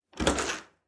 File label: Glass door is opened and closed, dead bolt locks. Open, Close Glass Door Lock, Dead Bolt